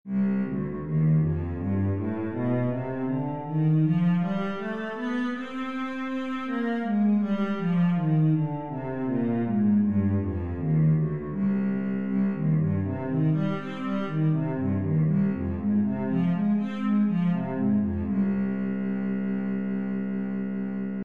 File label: c minor, cello